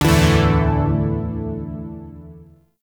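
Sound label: Shawzin Pentatonic Major Chord E; Zariman Shawzin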